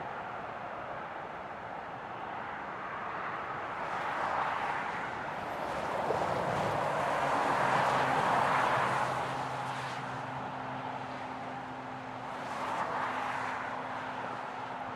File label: Night Traffic, UK Motorway; AMBTraf; H4N; InMotionAudio